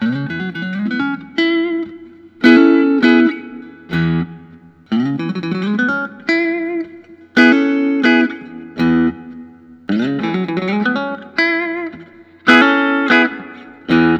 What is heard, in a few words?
fender custom shop 63 telecaster